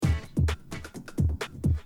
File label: drum loop